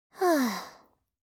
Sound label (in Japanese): ふう…; Windows等向けシステムボイス